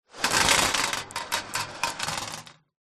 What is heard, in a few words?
leisure marbles multiple roll on metal